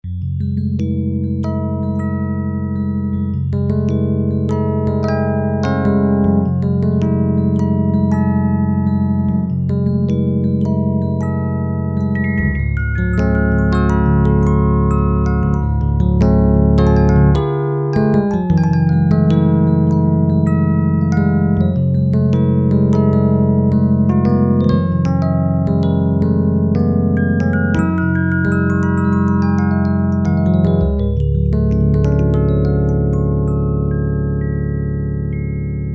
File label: Rhodes Electric Piano